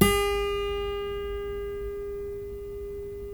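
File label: GUILD 12-string, Guitars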